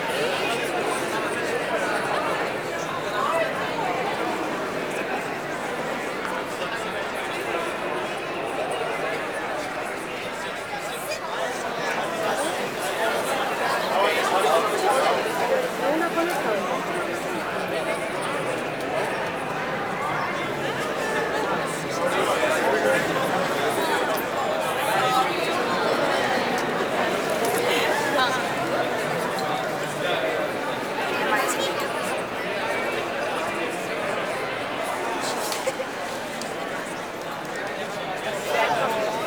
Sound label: Town Walla Loop; Duviri